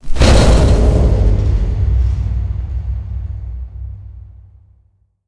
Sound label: assimilate fire layer; apocalypse